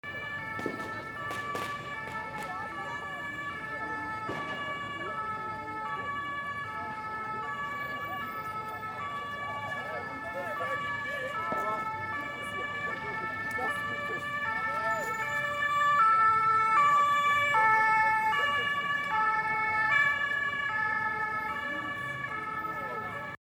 Silvester Martinshorn

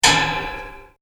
home Staubsaugerrohr

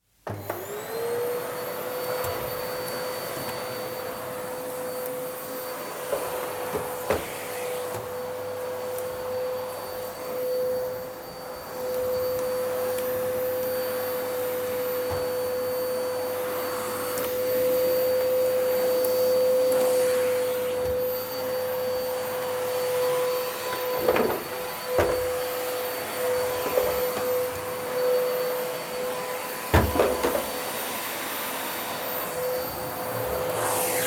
Staubsauger

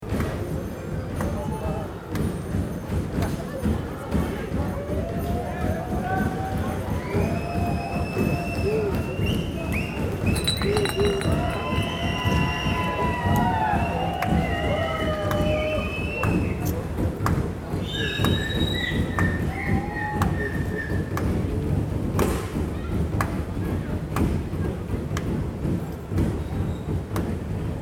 Schanzengroove

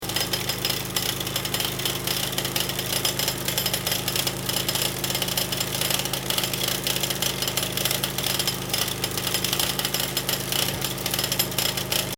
Presslufthammer kleiner

